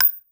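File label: bullet case bounce 308 cement; gmod; viper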